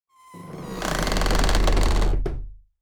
Designed cinematic wood textures forced bending stress